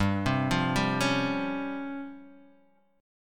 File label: C sharp Major 7th Flat 5th, chord